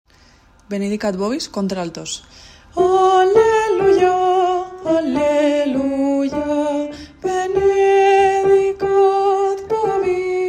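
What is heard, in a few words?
benedicat alto; Benedicat vobis; Georg Friedrich Haendel; Navidad JOCSMAB 2023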